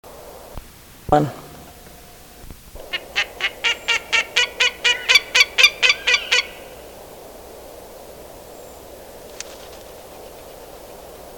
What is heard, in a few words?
burnt cabin creek